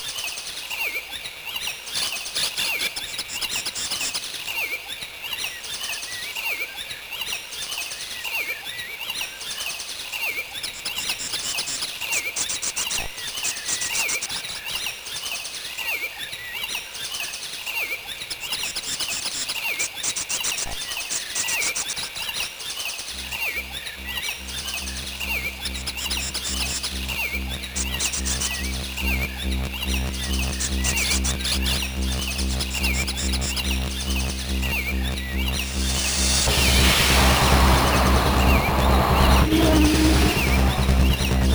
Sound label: trance forest; DIGI Booster (DBM)